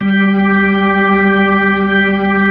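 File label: B-3, Hammond B-3 organ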